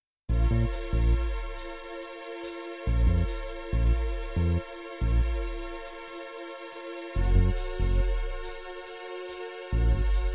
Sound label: fitness music for stretching 432 Hz